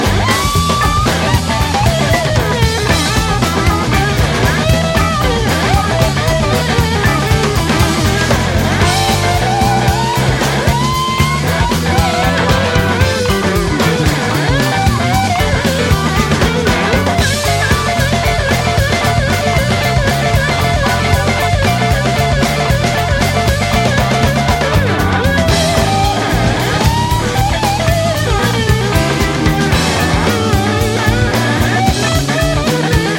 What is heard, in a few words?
Instrumental